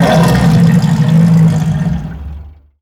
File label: beast monster cry